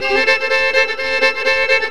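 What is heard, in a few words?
F FIDDLE GROOVE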